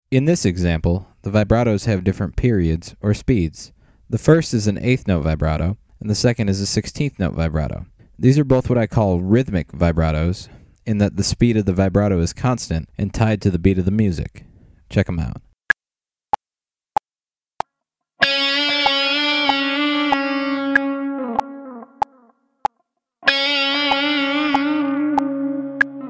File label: Vibrato speeds